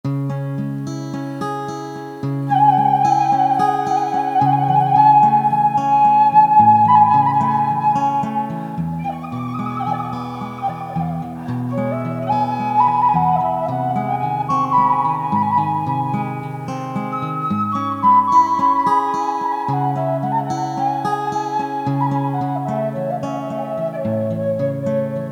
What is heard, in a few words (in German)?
cis Moll